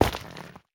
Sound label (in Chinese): 菌光体踩踏声